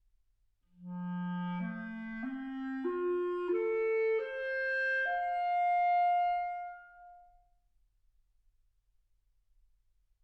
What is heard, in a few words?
clarinet